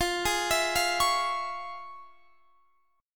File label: F Minor Major 7th Sharp 5th